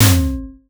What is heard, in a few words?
impact hit